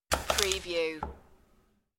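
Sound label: Cutting Crisp Produce Quick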